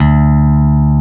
BASS D2; E-MU Formula 4000 Series Vol. 5; Protozoa; Proteus1 Presets

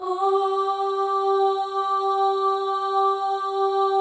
OH, FEM, Symphony of Voices; Spectrasonics

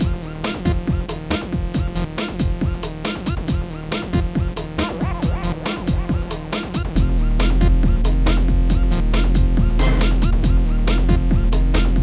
Get Your Groove on, 138 Fmin, Hardcore Breakbeat; Big Fish Audio; Hardcore Breakbeat Action

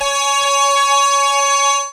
SYN Glider Syn; Best Service Gigapack 1

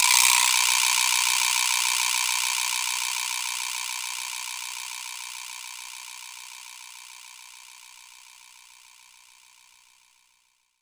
Vibraslap, Roland JV-2080

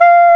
SAX XSAX, Saxophones; All Saxes

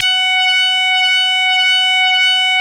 SQUEEK, LEADS; Masterbits; Vintage Voltage

